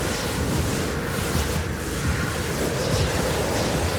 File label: summonerspell base ghost; LoL